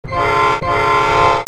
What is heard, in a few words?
TRAINS